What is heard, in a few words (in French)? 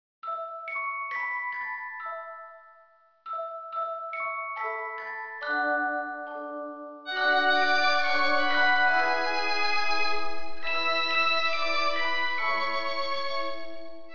musique